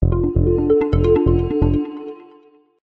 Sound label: transition